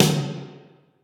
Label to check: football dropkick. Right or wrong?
Wrong